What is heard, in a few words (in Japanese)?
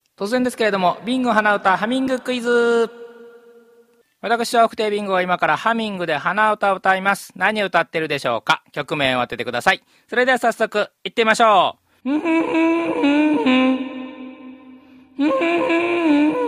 hanauta